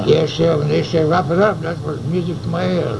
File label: music